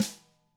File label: DW HIT, Drums SNRD; ILIO; Double Platinum Drums 2